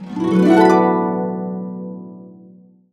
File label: D, Harps; USB Soundscan vol.62; AKAI